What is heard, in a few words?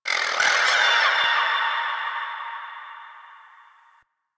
Landscape Cave Creature, Ambience; Warframe; Venus Open World; One Offs